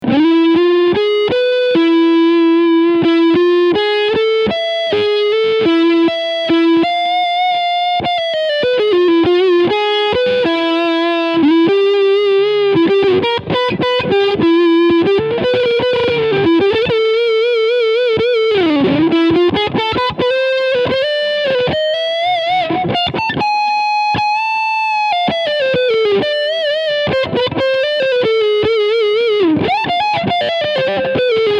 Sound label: Leadsound Front Humbucker